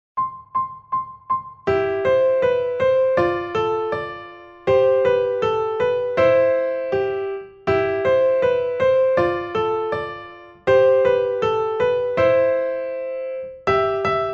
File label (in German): Zauberklavier 160 Primo; Uebungs-CDs; Playbacks; Aura Lee